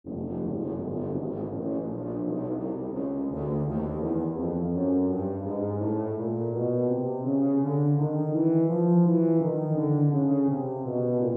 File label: chromatic scale tuba